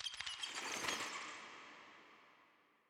Ambient bats

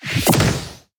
enemy acid evolved death

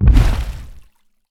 Explosion water

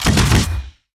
tower tricannon basic Attack

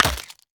gator melee; crocs

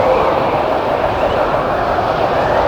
archmage twister

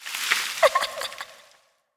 ambience branches and faery

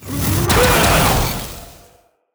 skill bolt; wukong tower pandas

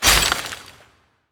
crystallized gnoll

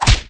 hit; pandamonium

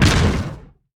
heroes bonehart basic attack impact

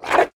enemy fire fox melee

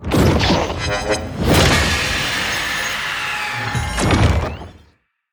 princess clone

